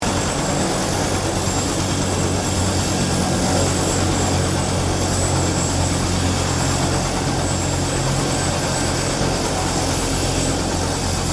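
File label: helicopter Bell UH1 Iroquois land shutdown; RAN UH-1 Iroguios; Helicopters (Sound Effects:Vehicles)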